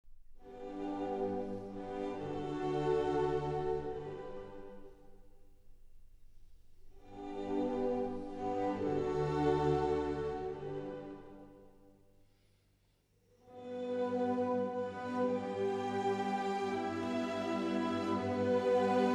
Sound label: Violin Concerto; Beethoven; Sondeckis